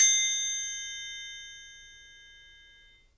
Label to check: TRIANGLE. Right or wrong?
Right